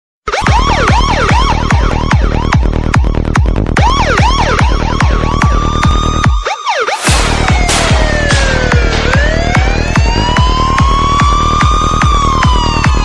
police siren remix, ringtones electronic

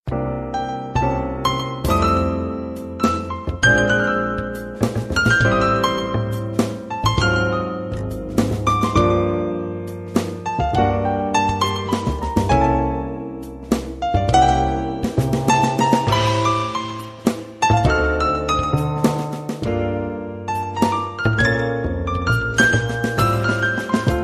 great jazz

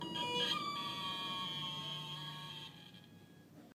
Sad Elevator Chime

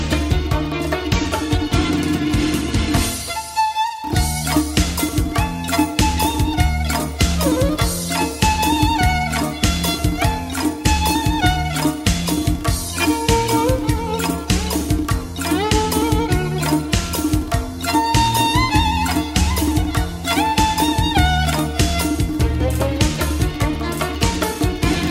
Persian Ringtone

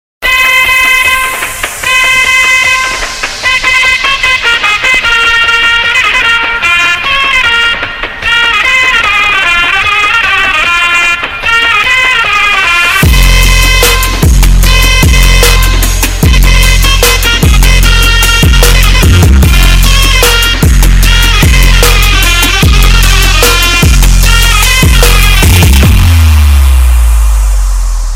Mehter Bass boosted

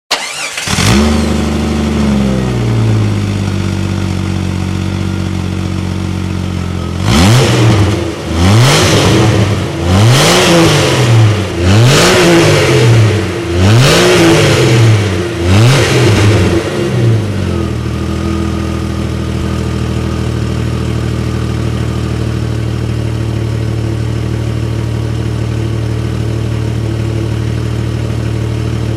Jeep Cherokee

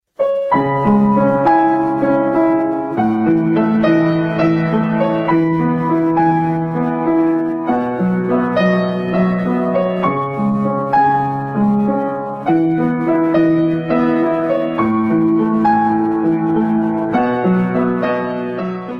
piano cover; ringtones

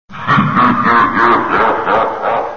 Skull laugh

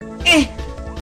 Eugh

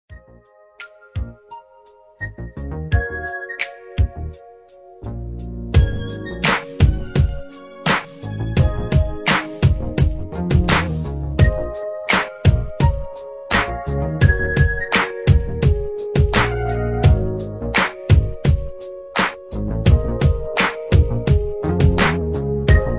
westcoast g-funk beat instrumental

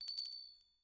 Bell

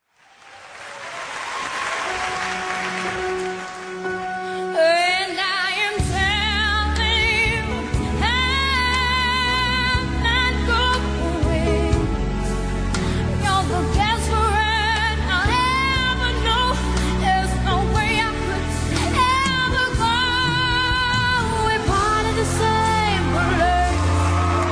11 years old singing like insane

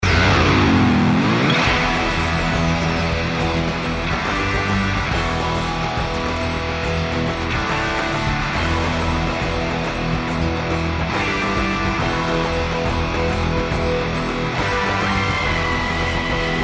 Guitar

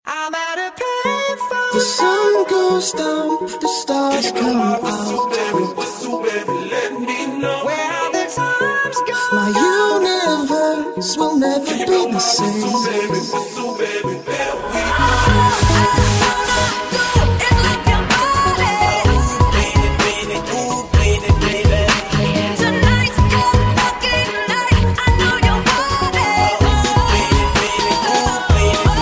Acapella Mashup

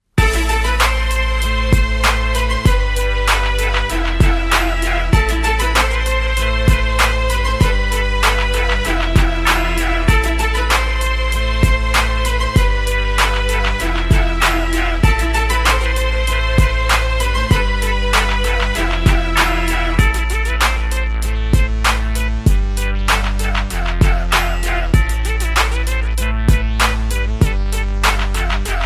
iphone ringtone

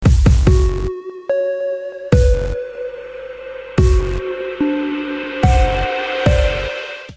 Loud Bass

